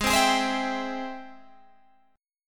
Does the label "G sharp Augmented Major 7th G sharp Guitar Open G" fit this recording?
Yes